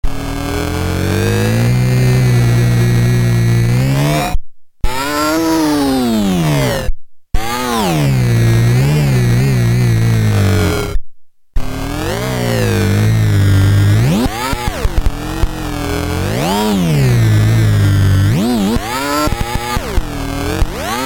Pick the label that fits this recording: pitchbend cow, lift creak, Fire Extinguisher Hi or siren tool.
pitchbend cow